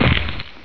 land; Heretic II Demo

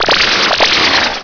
Scorpio Gun; MidEastGame

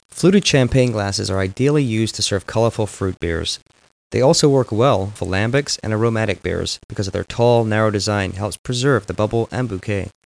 fluted

